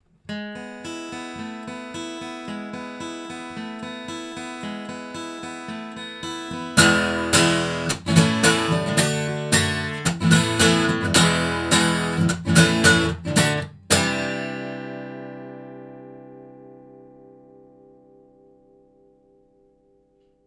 Oktava MKL-5000 omni guitar

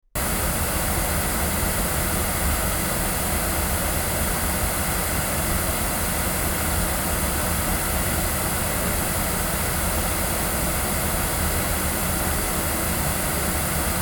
Avant CV-12 tube omni loudspeakers

